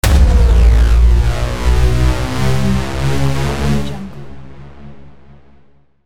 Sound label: dubstep hit transition